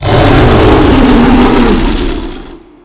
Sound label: shout long